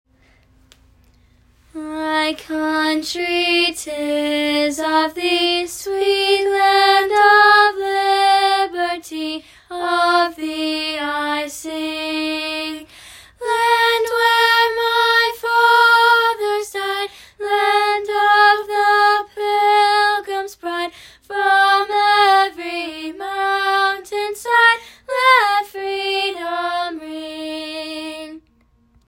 My Country This of Thee

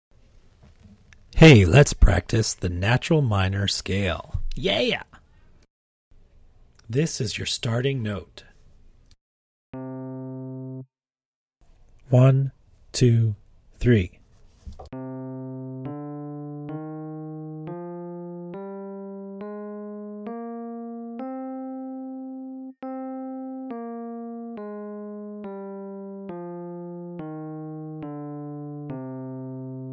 Minor Scales Practice

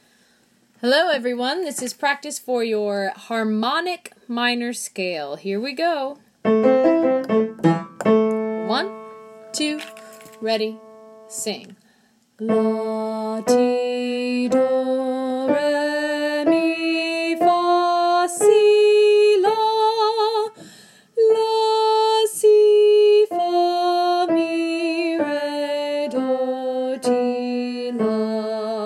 Harmonic minor

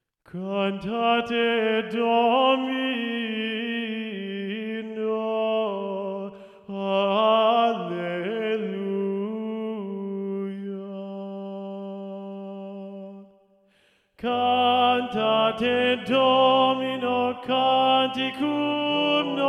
Cantate Domino TTBB Tenor 2 Predominant

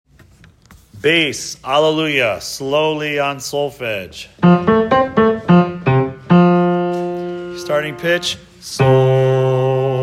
Bass Alleluia slow

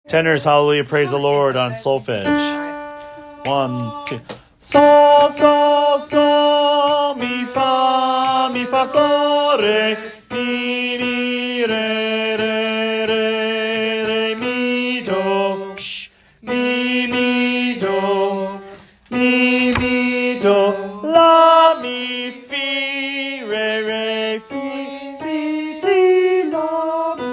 Tenors solfege

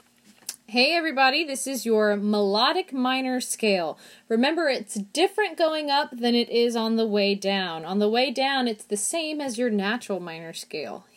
melodic minor scale

